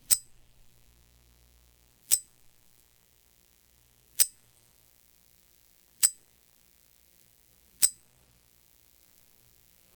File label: strong amplified metallic